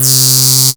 CREEPY C2, sequencer; Best Service Alpha Dance vol.2

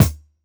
Kick, jungle breaks drum sounds

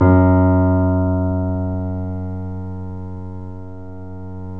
F#, 3D Pianos, Boesendorfer

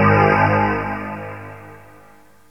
Bass (low harmonic); Sonic Foundry (Sony Creative Software); Ugly Remnants VOL-2; Basstuff

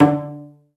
Cell Pz D3; Digital Sound Factory Virtuoso

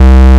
MONO POLY

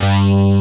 BIG BASS; Pro-Rec - Synthsonic; BASSES

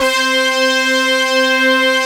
PUNCHY DN; CMP - Analogon; HP MORPH1

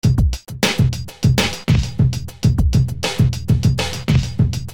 Beat

